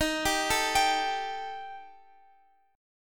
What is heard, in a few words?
Eb Major Flat 5th Eb down Guitar Open F; arpeggiated